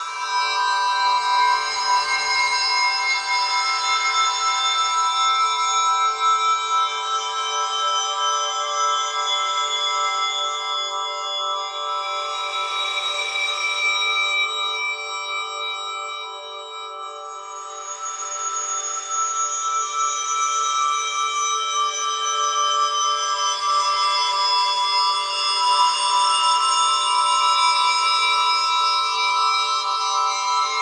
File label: C Glockenspiel Drone Move; Ambient Textures; Instrument Drones